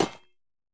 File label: break, copper statue